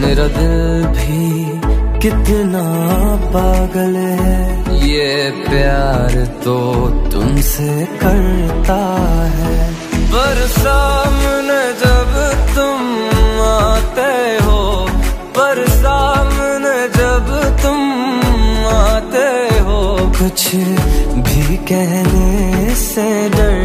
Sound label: Song Ringtone